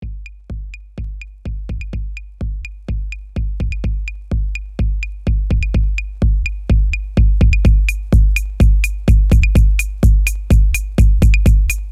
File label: Bonus Drums